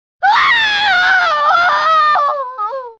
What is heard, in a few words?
impaled scream; deception; ashrah